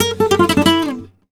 gta a, Flamenco, Guitar, Picado

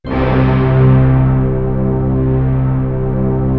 orchestra; East West; Global Sounds

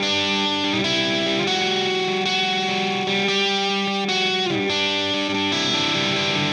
Silos Lead Guitar Riff, Indie Rock; Sonic Foundry (Sony Creative Software); Indie Rock Anthems; Session 11 - Silos

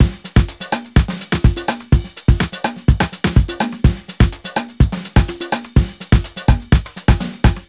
125 beat busy, Nu Jazz House; Nova; PowerFX

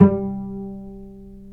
STR VC, Vcs Pz dry; STR Vcs Marc-Piz

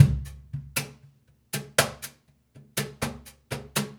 Cajun, Percussion, Drums; Essential Percussion

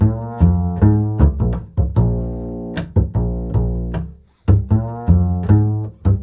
CITY A#, Bass, INNERCITY; Spectrasonics; Bass Legends

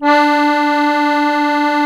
HOHNER, akkordeon

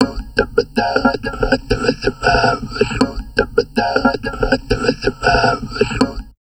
TALK, Drum Loops Crazy Processed; 160 TALK A; USB Soundscan vol.07; AKAI

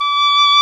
OBOE; Roland - LA Composer vol. 1

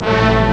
lo orch; ST-XX Amiga Soundtracker Sample Packs

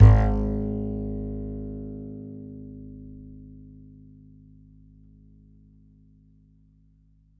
E, E string upright bass; Larry Seyer

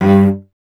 STR VC G 1, Cello; Miroslav Vitous; Solo Instruments VOL-1

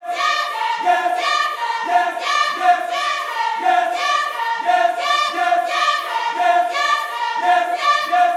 CIR YES, Vocal Gospel CIRCLE LPS

